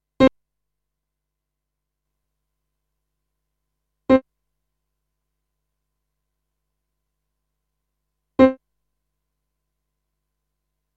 Piano Release Time Beispiele

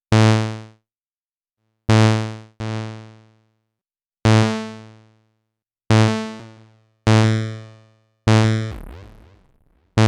Effekte Pro 2 Delay

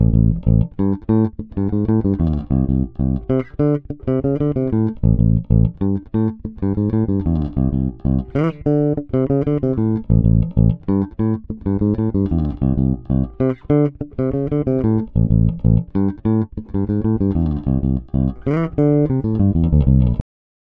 finger passiv

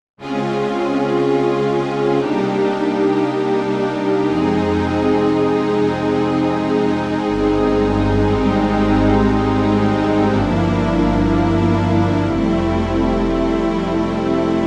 Yamaha CP88 Streicher

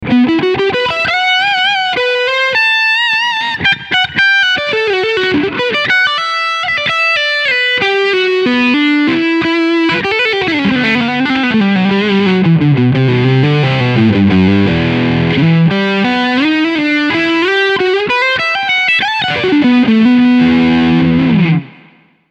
Max Overdrive mit Box

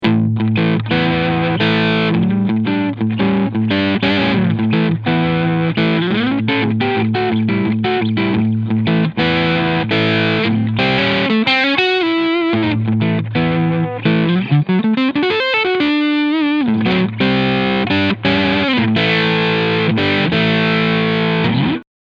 PRS NF 53 Crunch Sound beide PUs; Blue Matteo

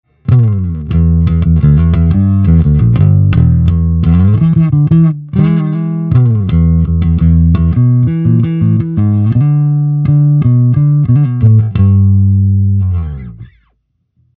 Mittenboost Bass MD421

